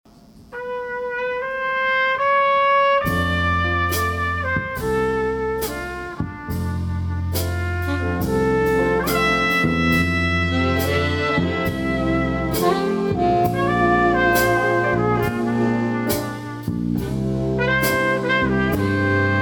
big band

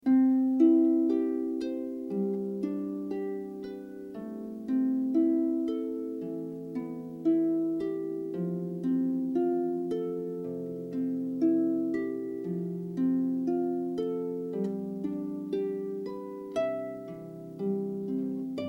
harp; Pachelbels Canon

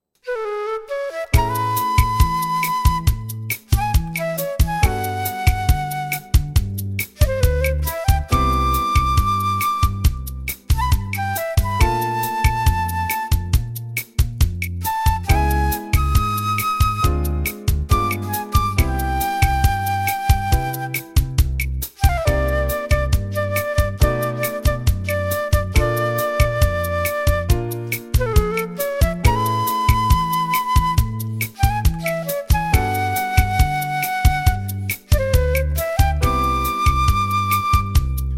jazz saxophone

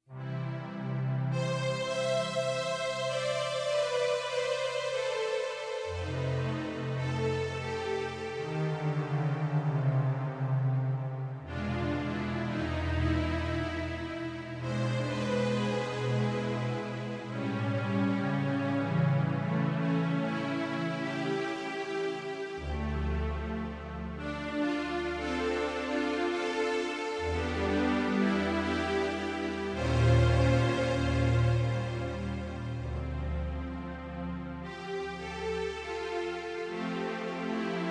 Strings Piano; Laura Cummings

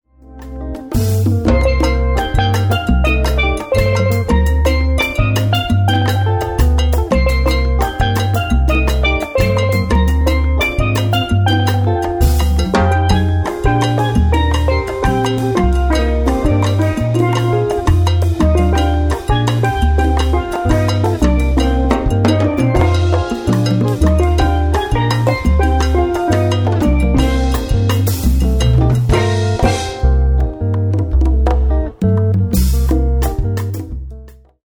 steel drum band; Caribbean Band; God Rest Ye Merry

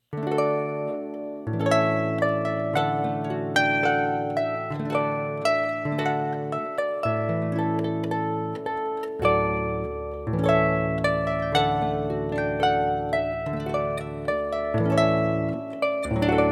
Classical Entrance Exit, harp

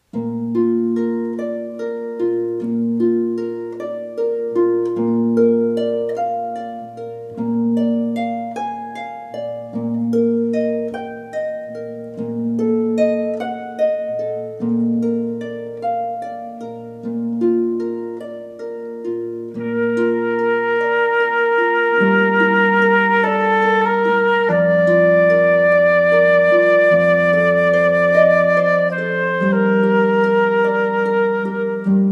Ave Maria with flutist, harp

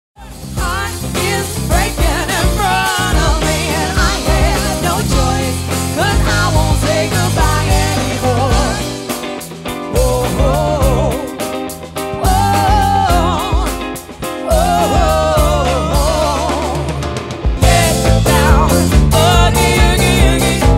Large Ensemble, Cover Band

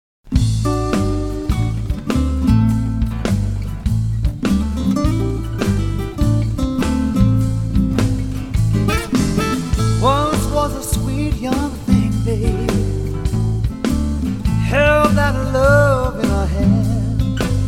Pop Acoustic Guitar

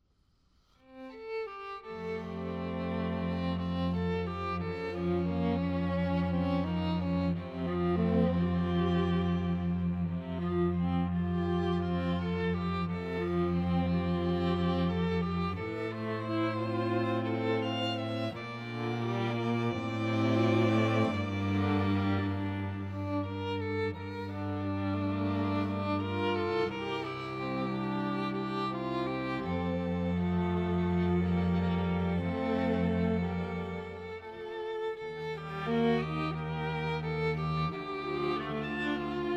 string quartet

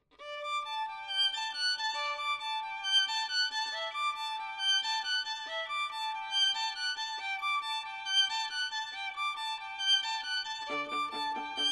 string quartet

